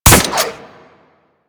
Harpoon Gun Shot; Warframe; Sharkwing; Grineer

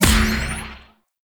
Pistol Active Reload Success; Warframe; Weapons; Operators; Drifter Pistol

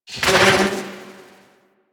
Microwave Pistol Tail; Grineer

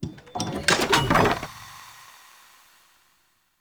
Animal Rescue Cage Open; Encounters

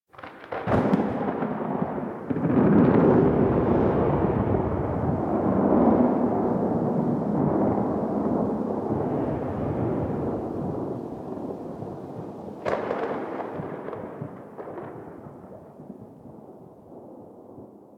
Exterior Rolling Thunder; Warframe; Ambience; Duviri

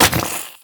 Chem Strike Nox Rifle Projectile Impact

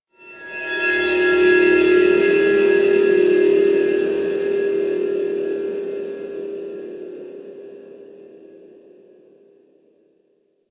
High Shimmer; Warframe; Ambience; PVP; CTF Orokin

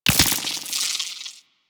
Mortar Mine Drop; Warframe; Venus; Venus Terra Mortar Moa; Abilities

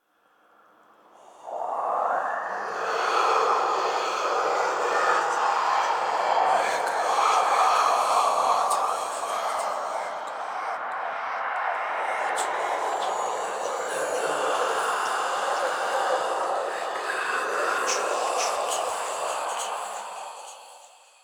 Whisper Blood Enemy; Warframe; Ambience; Entrati

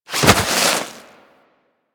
roll snow; characters; generic foley; Warframe

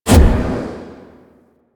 Void Projection Enter; Powers; Warframe; Focus; Operators; Wraith

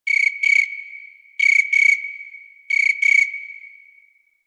Night Grass Insect; Ambience; One Offs; Insects; Warframe; Quests; The New War; Drifter Camp

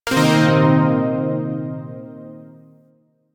Shawzin Blues Chord; Zariman Shawzin

